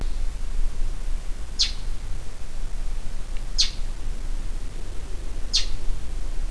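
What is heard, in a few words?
mocker call, Northern Mockingbird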